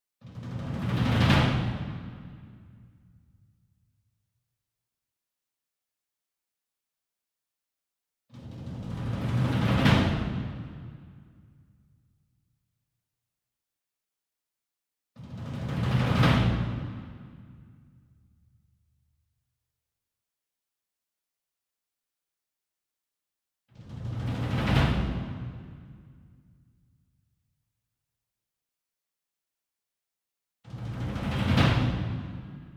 SINGLE DRUM Verdi 66 Inch Roll Hard Beater Far; boom library; Cinematic Strikes Construction Kit

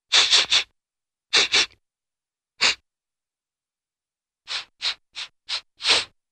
Various Sniffing; Cartoon; Hollywood Edge; Cartoon Trax

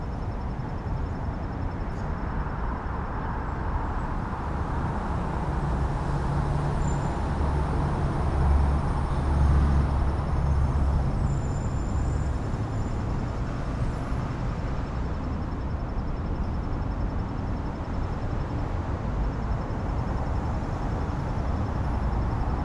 Urban traffic distant; urban and suburban; boom library

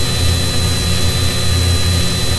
Scifi Dome Shield Loop; SFX Kit; Sci-Fi Loops

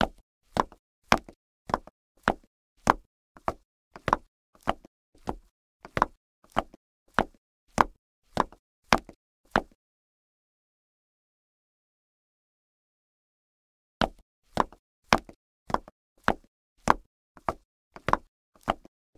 concrete zig zag; Pitch Black - A Detective's Demise

